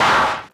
Clap; 99Sounds; Dark Engine; Burnt Hits; Snares Claps

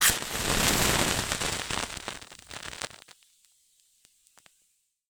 Match Ignite; InspectorJ; Party Pack

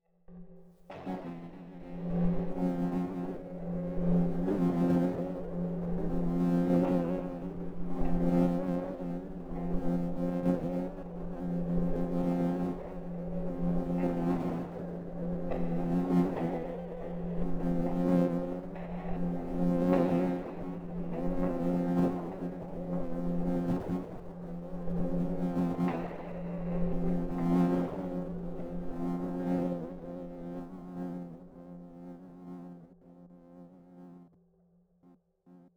Magnetic Field; 99Sounds; Magnetic Fields

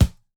Punching Percussion, Stick Hit, raw; 99Sounds

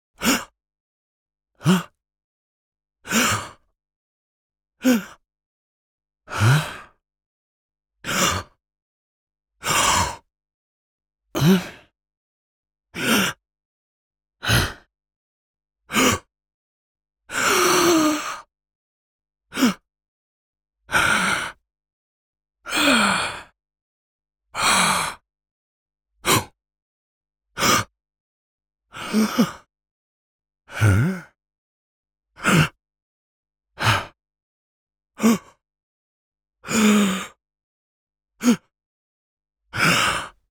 Male, Breathing, Gasping; Grunts

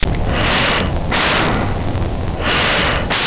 Rhythm

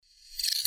Sword Sound; FXHome; Fighting Sounds

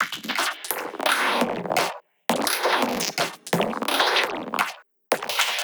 Loop, 70 bpm, Circuitbend

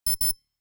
beep classic denied; Phil Michalski

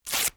paper rip; Books and Paper

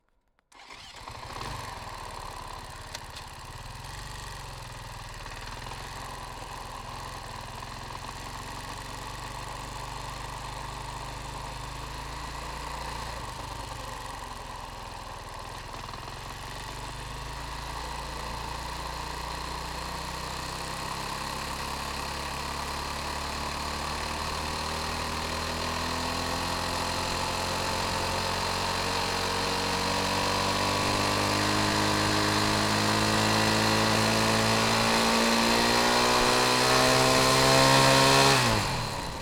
Husaberg 500cc Onboard Ramps Cooler, dirtbike; Husaberg FE501; 2013; Pole Position

